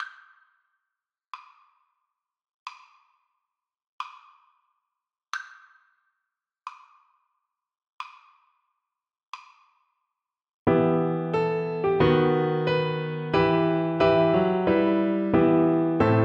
sonata, Cello; marcello

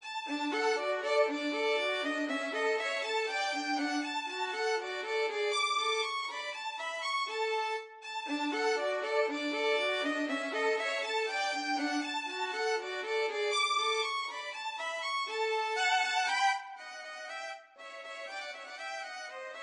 Mozart violin duet

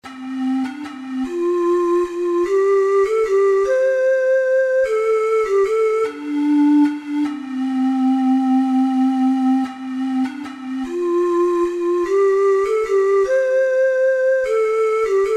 skyeboat, ocarina six hole

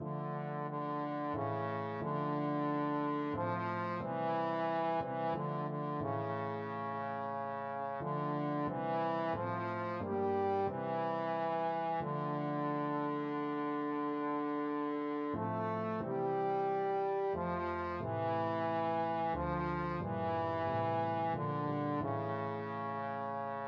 trombone

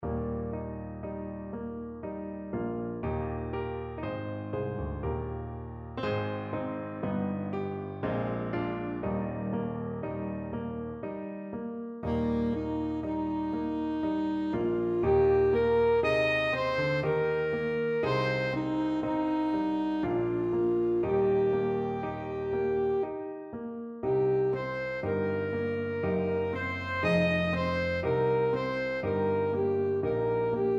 ASAX, saxophone

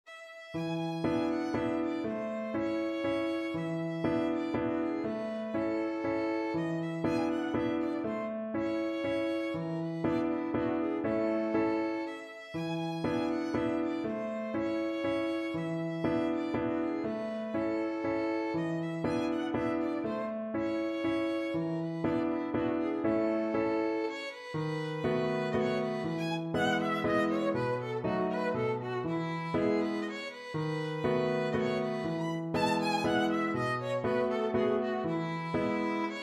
waltzes, violin; 2 waltzes s 126b

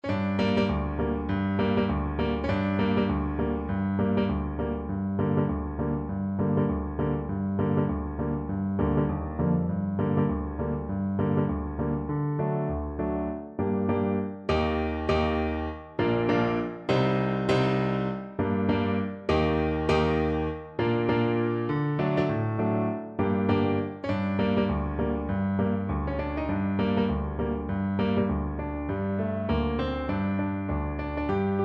wellerman Bassoon

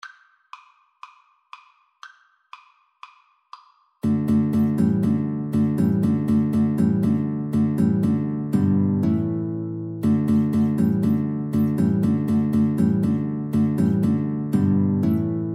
Guitar Cello Duet

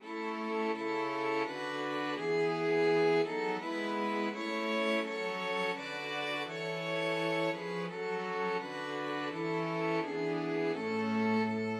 god save the queen, string quartet

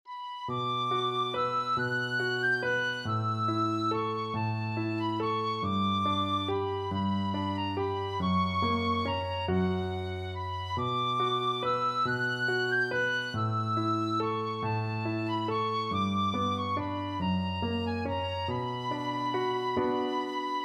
greensleeves, tin whistle

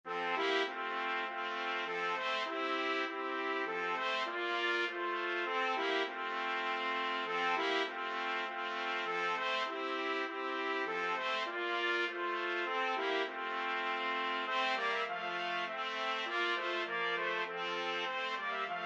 trumpet trio

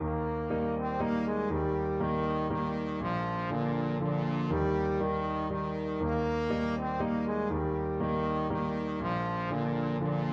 trombone

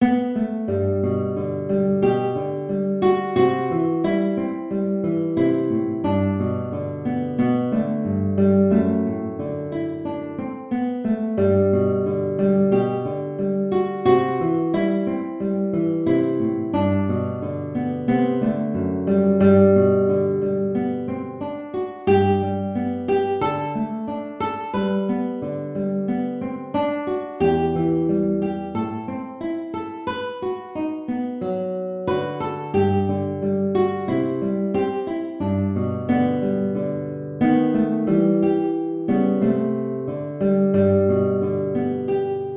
Gtr, guitar and vocal; Annie Laurie

